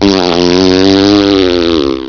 POOT, PEOPLE

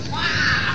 waa

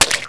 CRUSH

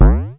BOUNCE, CARTOON

